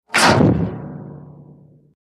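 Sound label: Large Switch Fldlight, switches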